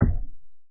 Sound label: clean rumble stomp